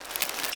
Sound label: tentacles in; DoomRTX